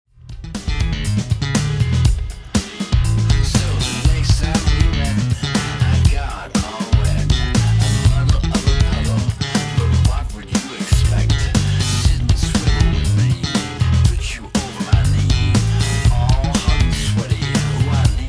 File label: Fast funk